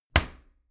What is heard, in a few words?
door wood close heavy